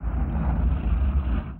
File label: vibrating Symbol; netent; eldorado